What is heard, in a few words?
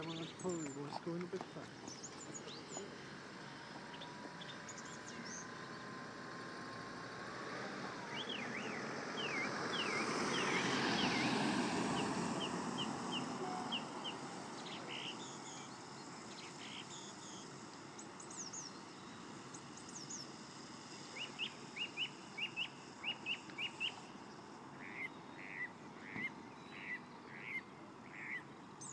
birds singing; about spring